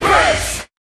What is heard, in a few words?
Bass